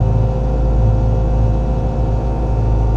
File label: Concert Spotlight